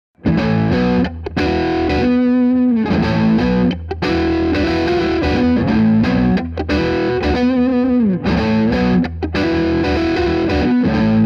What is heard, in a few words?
low gain